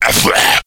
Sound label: pain, slender shiver